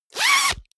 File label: zipper pullover jacket close fast